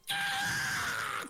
moo rage

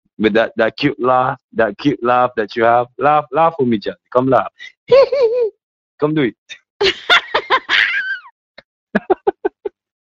cute laugh

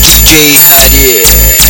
dj mid bass

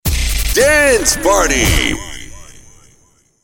dance party mix

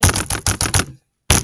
gurrrrrr

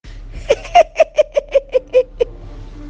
hihihi

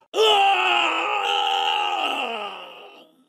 scream; mk9; smoke

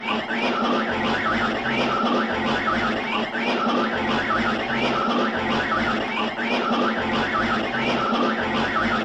spin; dr beansons

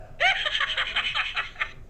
operation laugh

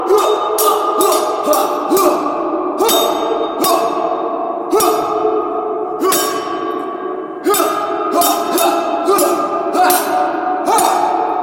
sword fighting

